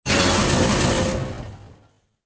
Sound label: metal fall; Soldier Of Fortune II Double Helix; XBOX